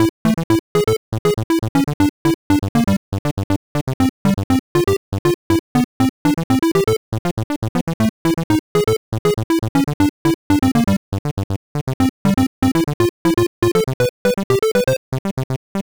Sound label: music